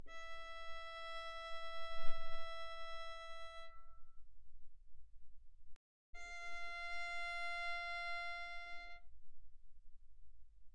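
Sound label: Violin arco pianissimo sul E E5 B5; Strings; violin2012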